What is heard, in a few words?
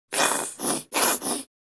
Plastic shampoo bottle squeeze